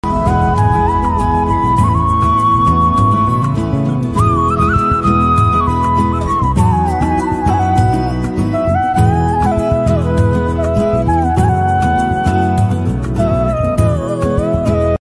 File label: Krishna flute ringtone; jay shree krishna